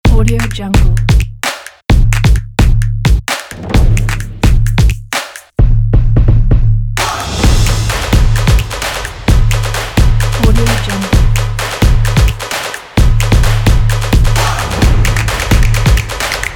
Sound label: stomping